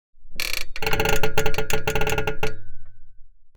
Metallic swing stress and pressure texture

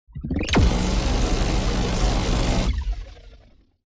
Cybernetic shockwave energy blast; Sci-Fi

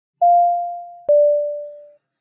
Airplane seatbelt ding fasten seatbelt signal; vehicle

